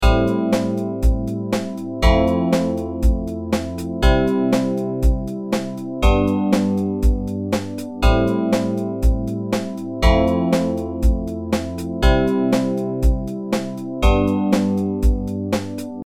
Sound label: Am7 Fmaj7 C G